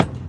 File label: Bleachers Perc, percussion; HOUSTON; Moody Park